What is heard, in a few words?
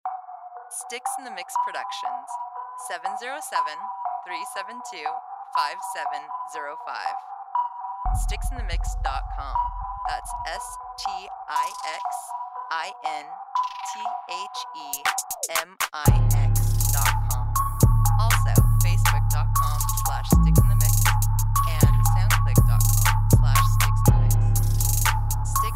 Warped Bell